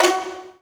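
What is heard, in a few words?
col legno D#4, stringed; East West; Global Sounds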